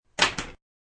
Ping Pong Racquet, Ping pong racquet slammed to the table; Sports